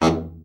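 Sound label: baritone, BARITON SAX; Best Service; Brass Super Section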